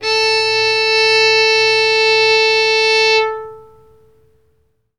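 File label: reference pitch fiddle a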